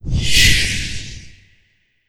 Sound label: Elementaliste Skill Spell